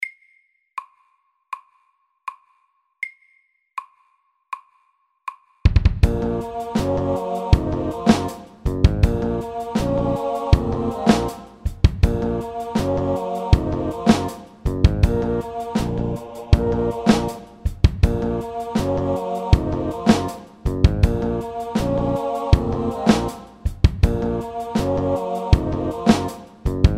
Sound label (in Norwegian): Octave rap MIDDELS